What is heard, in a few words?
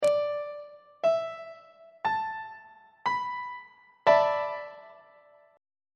d double sharp dominant 7th suspended 4th 3rd inversion; treble clef